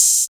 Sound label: hot open high hat sample A# key; Duration 0:01; Bitdepth 24